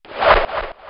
mine throw; weapons

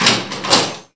lab door open; gesource; doors

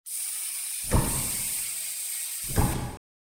airlock; gesource; doors

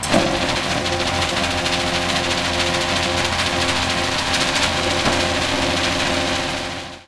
security door move; gesource; doors

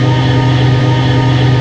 bunker door move; gesource; doors; bunker classic

